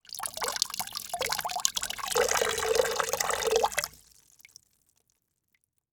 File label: Liquid Water Pouring Into Water In Plastic Bucket Short